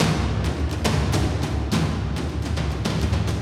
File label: reel spin toms; superstriker